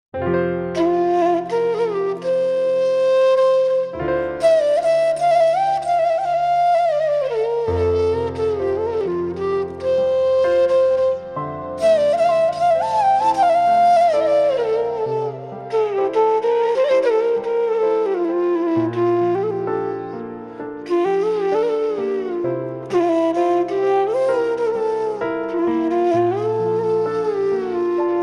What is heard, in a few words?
Flute Cover